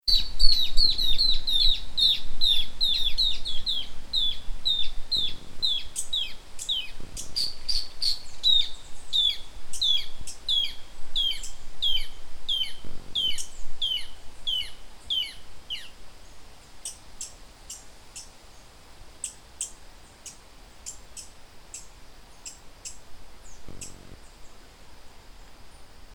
Phacellodomus erythrophthalmus João botina da mata